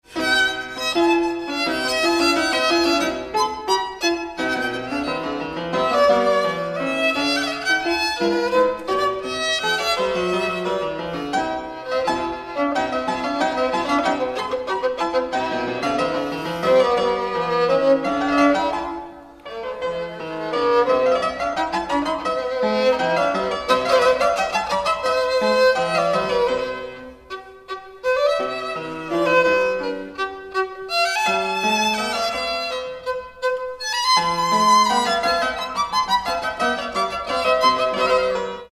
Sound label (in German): Konzertvioline, Violinsonate; KV403